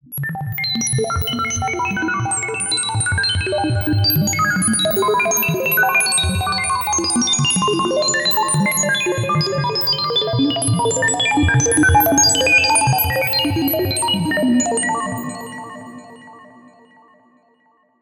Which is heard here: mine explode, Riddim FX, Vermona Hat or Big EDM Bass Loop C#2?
Riddim FX